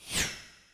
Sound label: Swoosh Light